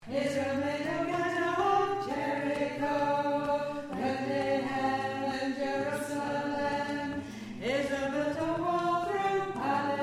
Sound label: alto